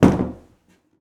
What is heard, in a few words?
wood impact